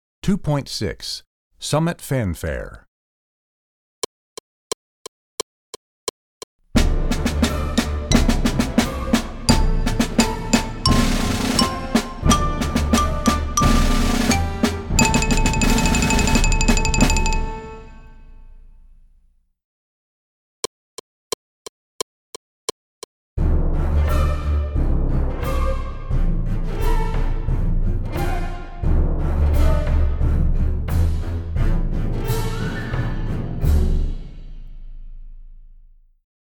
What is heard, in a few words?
Fanfare, Percussion; Percussion Book 2